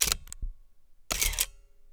DECLENCHEMENT PAUSE LONGUE

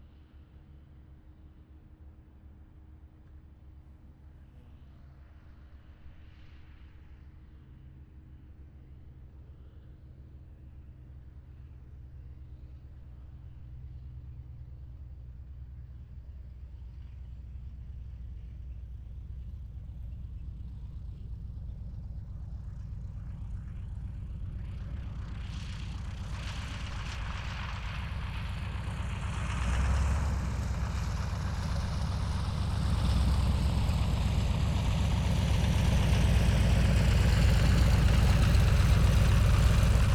ATTERRISSAGE

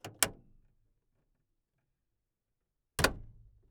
PORTE OUVERTURE FERMETURE INTERIEUR

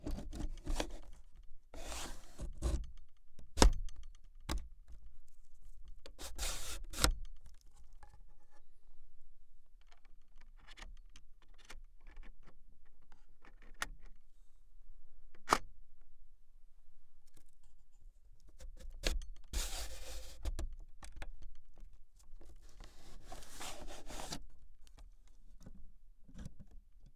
PRISE DE VUE

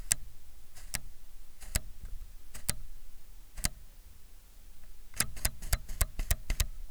CRAN DIAPHRAGME MAMIYA RZ67

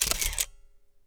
DECLENCHEMENT

